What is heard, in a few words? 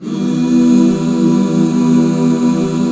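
HAL E minor right, Back Chords; Sound & Vision - Gigapack II CD 1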